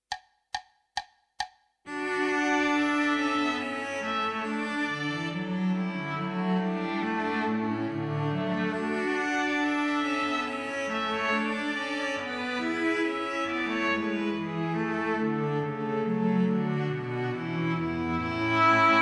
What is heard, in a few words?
gavotte; minus Violin 1